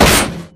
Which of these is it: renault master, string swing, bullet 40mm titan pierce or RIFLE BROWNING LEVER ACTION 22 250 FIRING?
bullet 40mm titan pierce